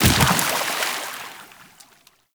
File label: Land Water, Footsteps; Warframe; Tenno